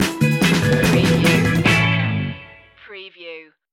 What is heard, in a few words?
give me one time stinger